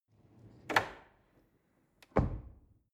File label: drawer cutlery close draw short